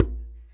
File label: arabic perc, Arabic Percussion Kit